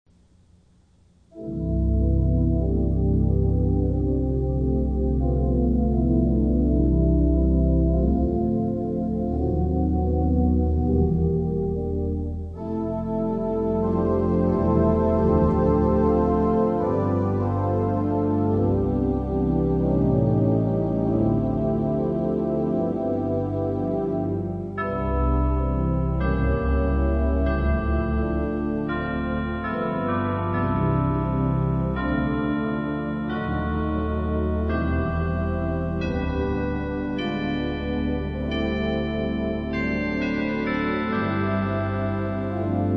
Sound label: Air Force Hymns ( (with Chimes))